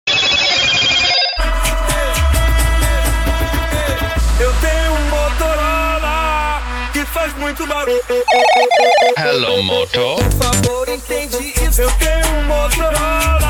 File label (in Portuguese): funk do motorola